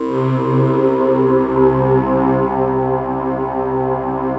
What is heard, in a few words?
Microwave; Optical Media International; Sonic Images Library; Wavetable